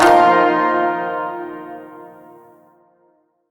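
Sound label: Shawzin Pentatonic Minor Chord E; Chords; Warframe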